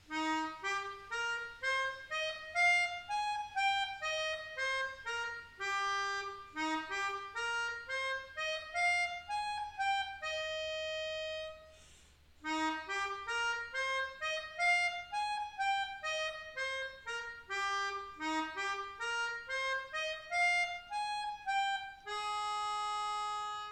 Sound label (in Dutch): Mijn Melodie, Melodica